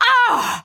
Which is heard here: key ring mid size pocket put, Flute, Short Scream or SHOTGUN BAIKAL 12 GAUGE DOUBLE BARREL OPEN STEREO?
Short Scream